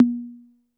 D3 Analog Tom, Yamaha PSR-E323; Analog Kit

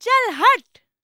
VOICE, EASTERN FX; ILIO; Vocal Planet

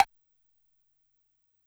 Wood Block L, Yamaha PSR-E323 Standard Kit

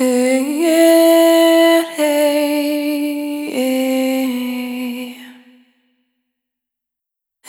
Single Voice Melodic Loops

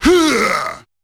D#4 Huuaah!, Yamaha PSR-E323 Sound Effect Kit

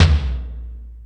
Metal Drums, E-MU Pro-Cussion; drum machine

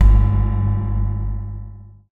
TAM T; Xsample VOL-9

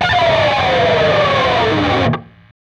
PRS SCRAP, Guitars; PRS SCRAPES

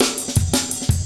heavy live ride cut, drum loops; Zero-G Jungle Warfare 1, 2 & 3

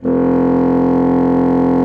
F1, bassoon; Xsample VOL-6

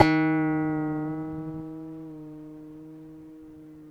ASI KOTO D#2, Yamaha VP-1, ASIA-KOTO; Music Store; 90's Sample CDs

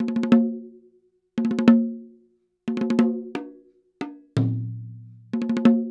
PERCS 90BPM; INIS - Opium

